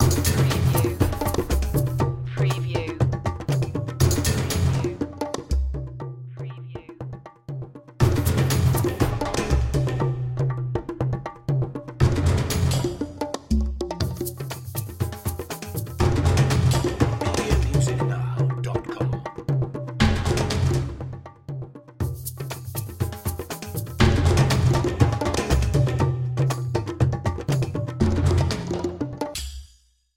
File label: Musical; Production Element; Sound Effects Bible